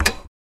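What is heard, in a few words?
Sony Camera 5850 Pause, buttons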